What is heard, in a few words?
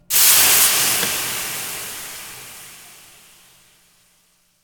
Water Steaming On Hot Surface